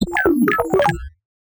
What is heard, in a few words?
BASS SHOT